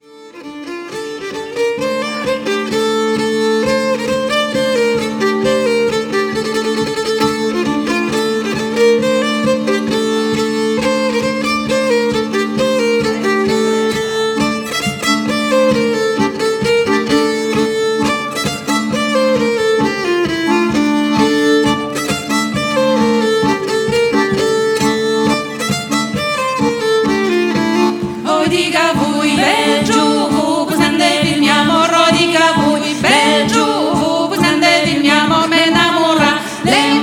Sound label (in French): bourree 2tps